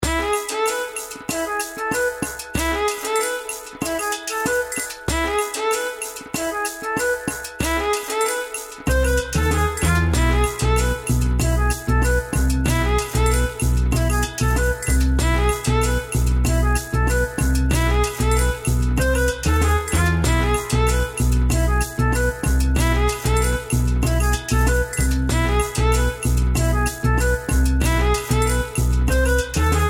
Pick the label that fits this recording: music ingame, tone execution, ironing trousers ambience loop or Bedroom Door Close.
music ingame